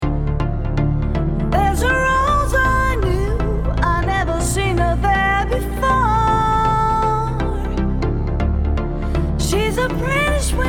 Vox DRY

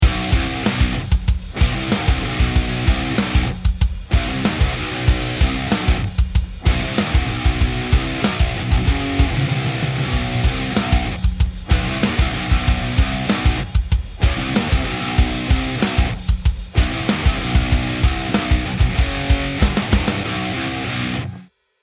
New Metal Plate Reverb DRY; Verbtone

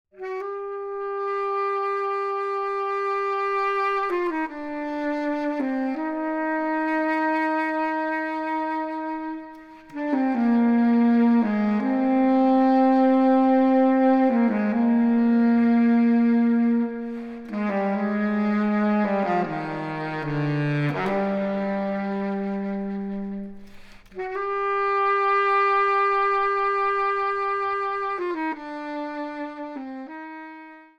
Sax Large Thin; Verbtone

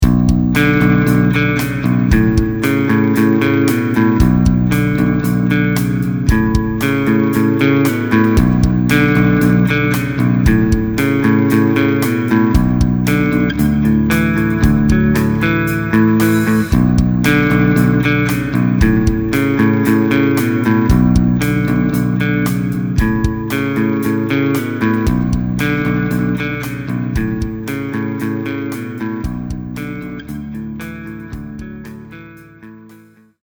Clean Rock Guitars WET

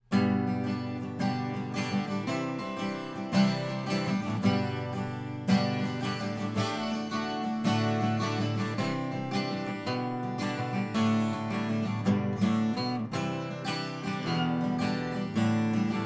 Coincident Guitar; Four couples of microphones have been placed in front of some instruments

